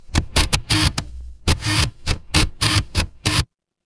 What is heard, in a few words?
mechanical machine